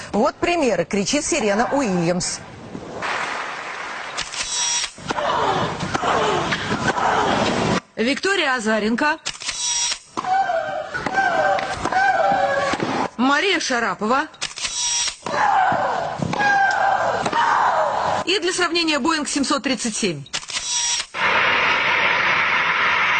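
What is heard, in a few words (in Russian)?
сравнение криков теннисисток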